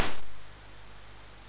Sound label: sid MOS6581R2, GAMES; Face Off